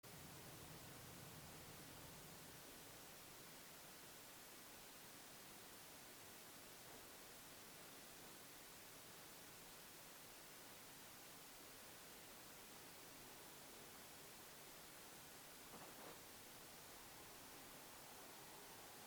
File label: Floor creak; Devoe House